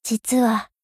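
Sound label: attack; hina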